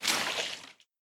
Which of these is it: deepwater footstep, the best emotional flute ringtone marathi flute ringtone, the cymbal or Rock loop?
deepwater footstep